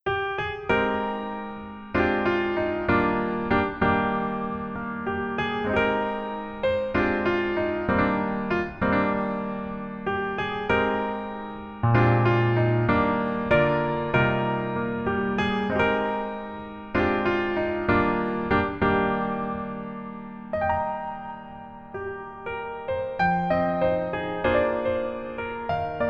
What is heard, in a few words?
Piano ver, 멜로디MR